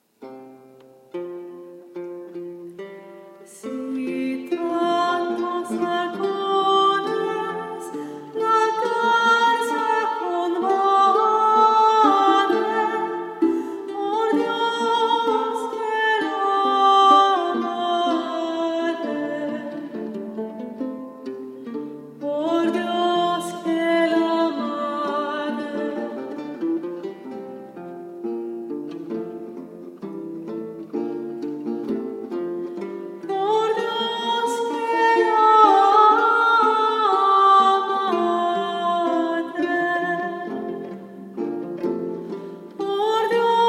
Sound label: Vihuela Quartet; Sy tantos halcones primero diferencia; Luis de Narvaez